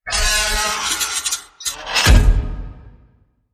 Scrape Hit

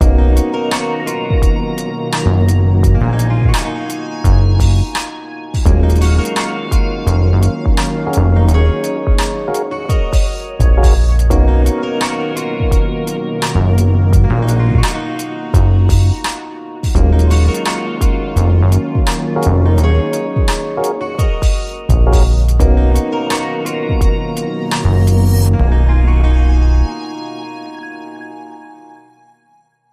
NeoSoul No Vocal FX

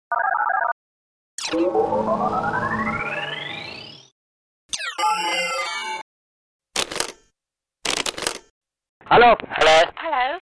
Telephone

